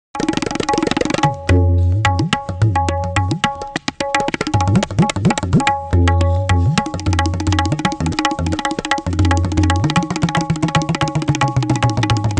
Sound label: tabla